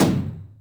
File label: Yamaha RM 50 Toms